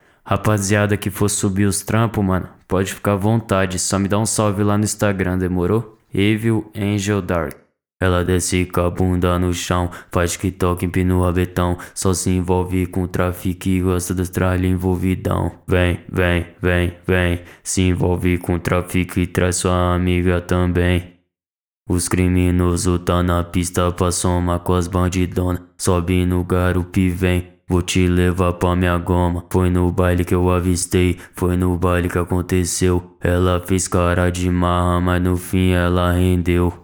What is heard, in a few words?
ACAPELLA MEDLEY